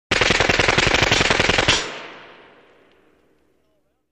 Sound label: 9mm Uzi Automatic Fir